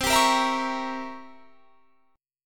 C 7th Flat 9th C; C7b9 strummed; 20,22,20,21,23,21

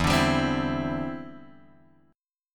E Minor 7th Sharp 5th, down, Guitar, Drop D

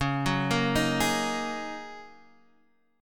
F sharp 6th Add 9th C sharp

